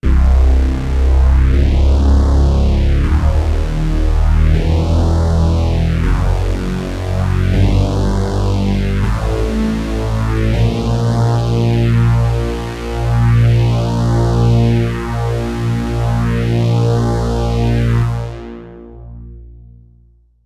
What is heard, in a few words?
octascale stereo polyphase